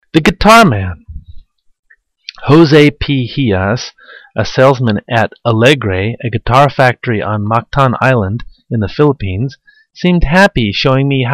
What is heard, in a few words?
cebu guitars